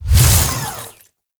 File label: Mech Melee Swing; Nechro